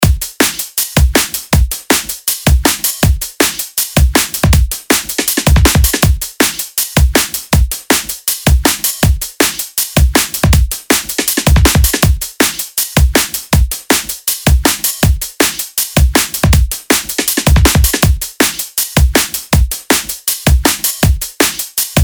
DRUM LOOP DNB 160BPM

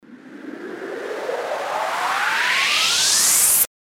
RISER

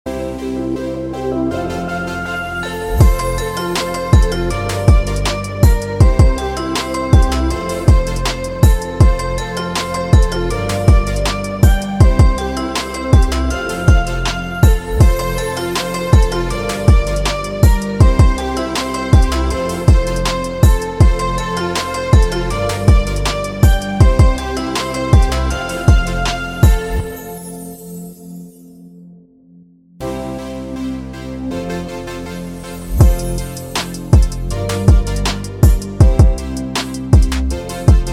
BED HOLIDAY URBAN FMAJ 160BPM

